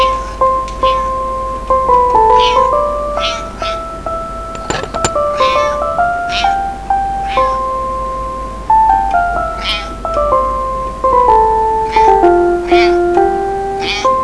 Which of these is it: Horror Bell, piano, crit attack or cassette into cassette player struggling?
piano